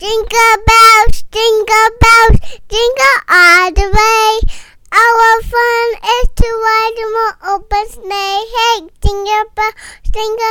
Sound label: Jingle Bells